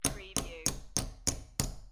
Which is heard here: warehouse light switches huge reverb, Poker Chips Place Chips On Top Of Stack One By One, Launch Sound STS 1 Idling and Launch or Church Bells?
Poker Chips Place Chips On Top Of Stack One By One